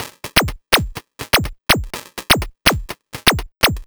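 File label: Percussion, 124bpm, Nu Dance Electro; Zero-G; Soundclash; Combi Loops; Filthy Fury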